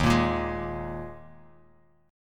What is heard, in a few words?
D sharp Suspended 4th D sharp, Bass Standard; strummed; JGuitar